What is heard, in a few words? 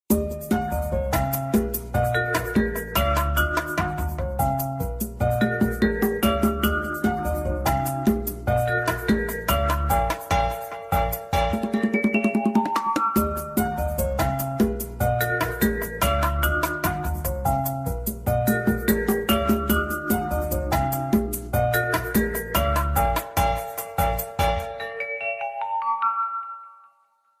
city salsa